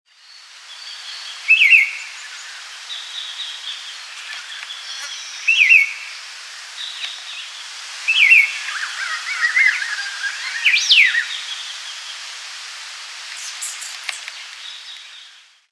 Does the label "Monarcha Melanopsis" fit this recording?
Yes